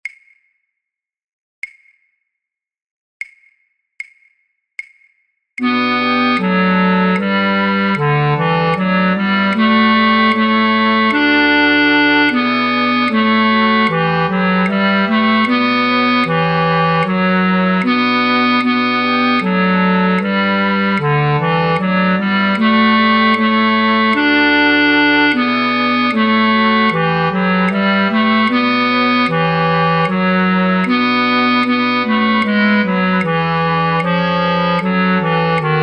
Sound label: flute choir, Basfluit; voor oefentracks; Matthaus